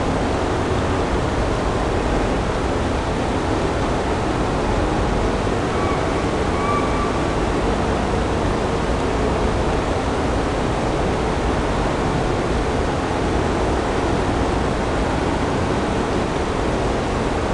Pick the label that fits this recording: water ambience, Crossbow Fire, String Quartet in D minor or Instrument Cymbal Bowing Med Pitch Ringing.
water ambience